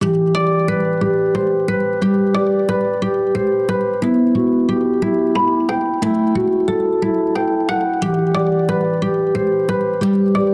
cloudburst acoustic

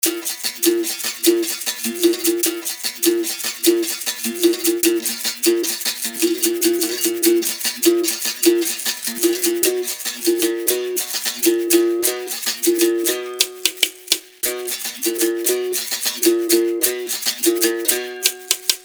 berimbau

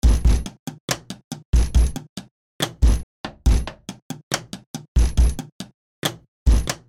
table tops

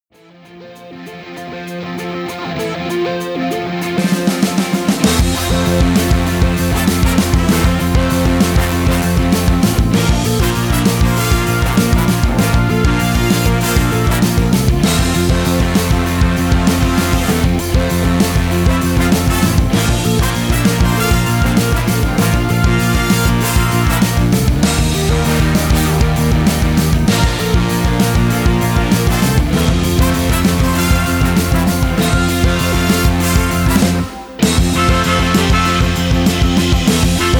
jam session

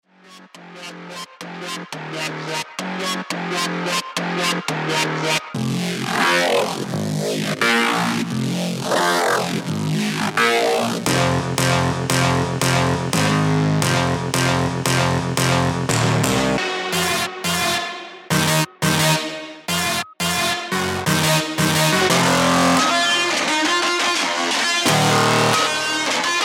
synths

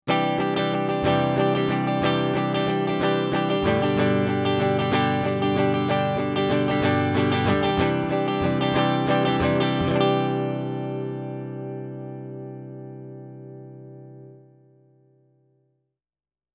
rackmode phaser tele

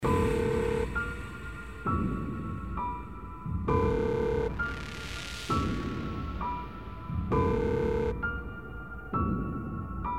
aggressive kit

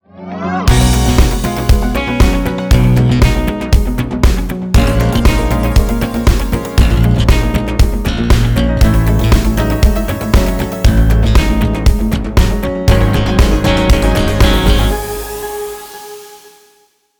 soft sat +6db; saturator x

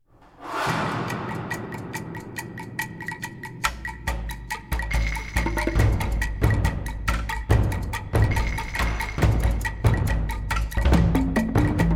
the grand angklung

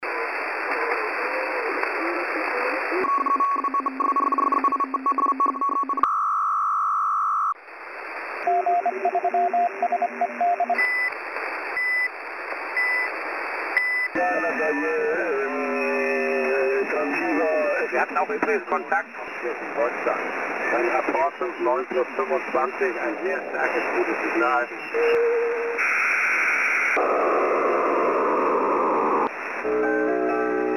vintage 4 band radio sw

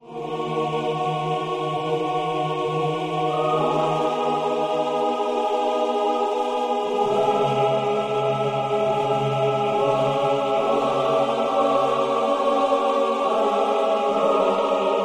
choir choral ensemble

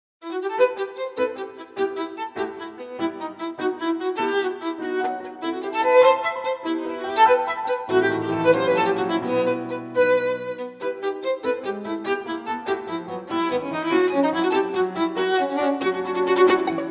italian violin sonatina

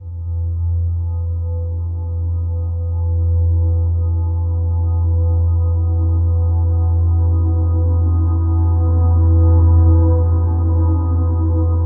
cinematic textures and drones

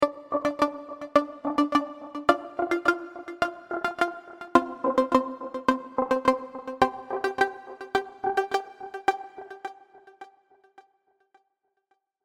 serum seqs arp; chiqui audio

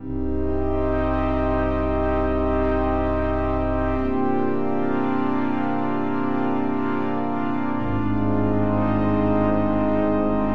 wavy pad

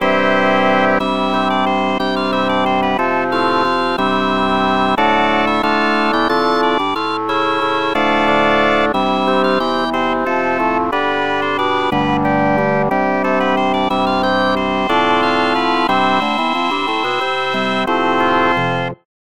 farfisa compact organ

